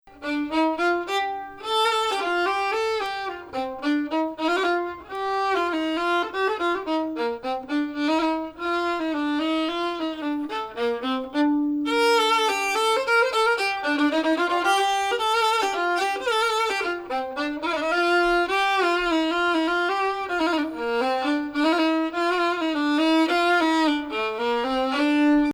Bayati, Bayati 1 is tonic throughout; melody uses notes from 6 below to 6 above